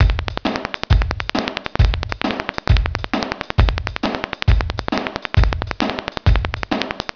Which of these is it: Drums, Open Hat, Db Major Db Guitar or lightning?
Drums